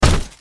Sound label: foot